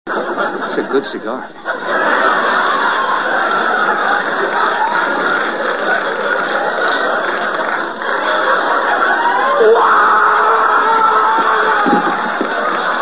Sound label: fire